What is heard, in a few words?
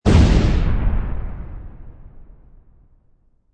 Explosion, Large, medium distance explosion, short; Lynne Music